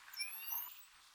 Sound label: Chirp